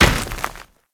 Moa Step Dirt, Footsteps; Enemies; Warframe

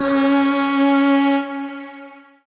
Void Shawzin Oct Two C Sharp; Warframe; Zariman

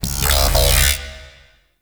Alt Fire Growl, Weapons; Warframe; Corpus; Tenet Quanta

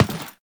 step default, footsteps; Warframe; Teshin

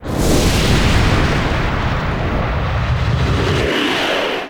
Hazard Throw; Ember Prime Boss; Warframes

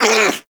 Armadillo Vocal Pain; Warframe; Characters; Hunting Critters

